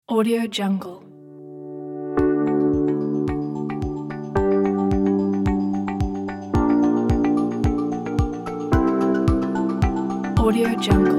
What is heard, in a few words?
calm technology pack